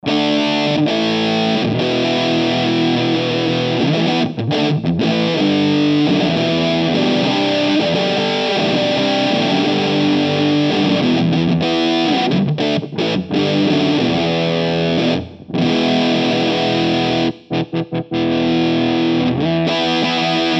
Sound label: egg twist 6L6 and cab